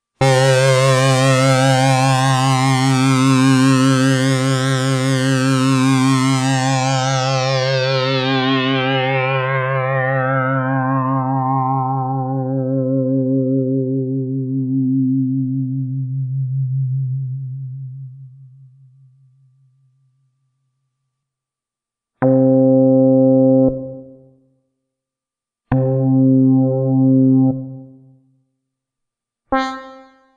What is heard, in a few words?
Tape